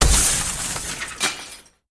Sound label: Window Crash Bat; Game rip; MiSide